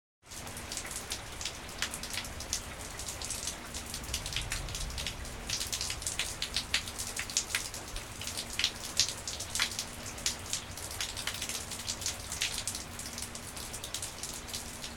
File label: Rain; Soundzabound